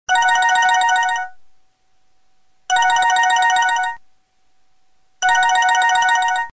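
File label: Huawei G2100, ringtone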